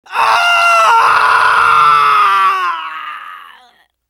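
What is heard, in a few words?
scout pain critical death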